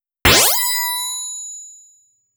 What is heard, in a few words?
Coin Insert Sound